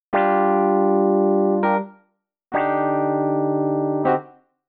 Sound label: Keys Line 100 G, 100bpm, hiphop; musicradar